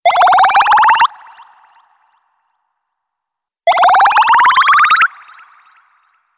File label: Sci fi Mobile